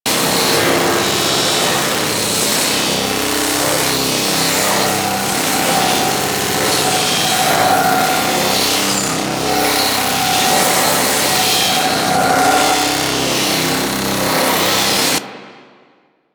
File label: Laser Skin Printer Loop; Ambience; Props; Warframe; Entrati